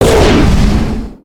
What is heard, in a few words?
Dragon fireball, creatures organic